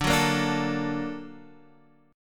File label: Bb Augmented Major 7th D